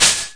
dx100 Pink noise C2, Yamaha DX100; DRUM MACHINES

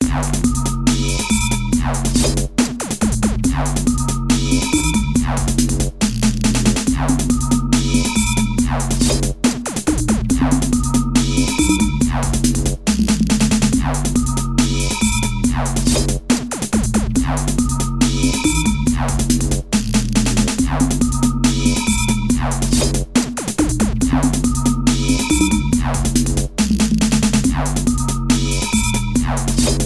Complex Beat

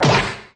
hipno hit; Punches Hits Discoblasts; single hits

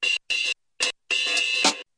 110 posthole filler, DRUM LOOPS and BREAKS; 101 to 110 bpm

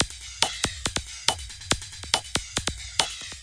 140 bpm, super electro, drum loops and breaks

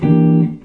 mellow jazz guitar, Chords

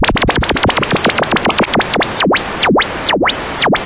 noise SH101, Noise Sweeps

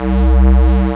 drone, video games; starcas

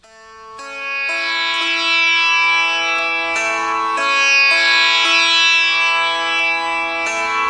Majorish, far east drones and plucks

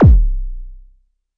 mbrute kk, Arturia MiniBrute; DRUM MACHINES

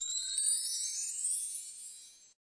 fairy bell tree; Western and Latin Percussion; single hits

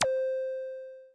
electric pip; Weird and Interesting Hits; single hits

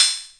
Korg KPR-77

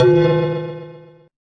China Tube, Cyborg Kit

